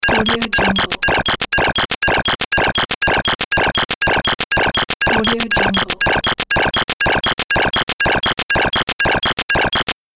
games retro contraption

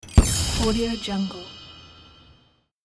magic disappear

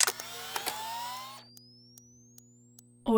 futuristic weapon charge; prosoundfx

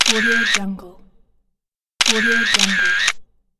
camera shutter

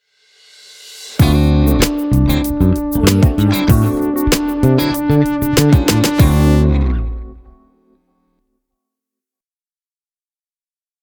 groove logo; rodrigo-flores-music

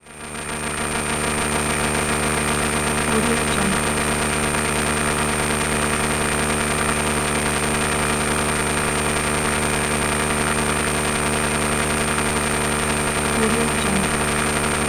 electrical interference sound

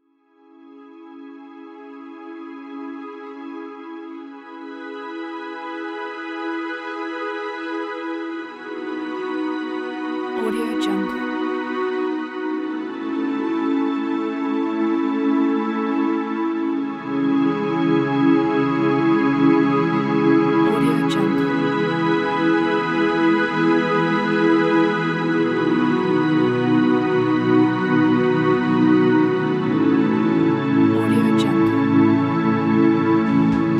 atmosphere; masterblockbuster

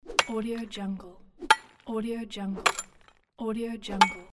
mining

